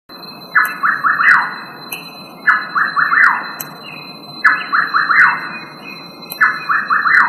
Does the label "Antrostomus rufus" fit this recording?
Yes